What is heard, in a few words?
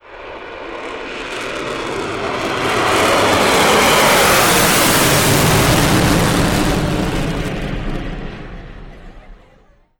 concorde; Simutrans; Pak128.Britain-Ex